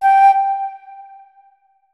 flute notes; Hideout Furniture

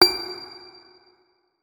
notes, guitar; Hideout Furniture

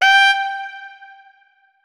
notes, keyboard saxophone; Hideout Furniture

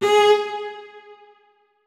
notes, keyboard; Hideout Furniture

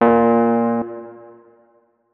notes, keyboard, electric piano

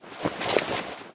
holster, weapons ks23; Boomsticks and Sharpsticks

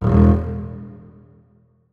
notes, keyboard strings; Hideout Furniture

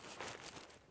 raise, weapons, rattle; EFT Aim Rattle

